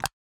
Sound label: lock; SecurityInterface